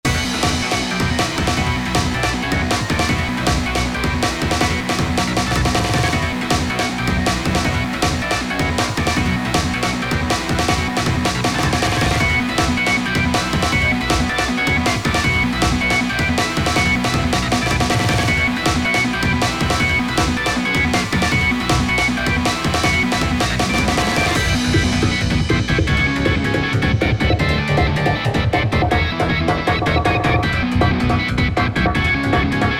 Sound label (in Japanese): マヤカシ Loop